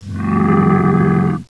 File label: CAMEL; Caesar III